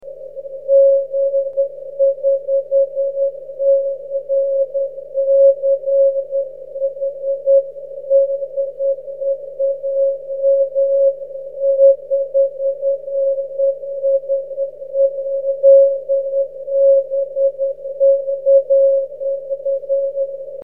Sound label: cw, 23cm